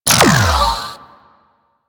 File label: Sentient Laser Beam Fire; Warframe; DE; Sentients; Sentient Trooper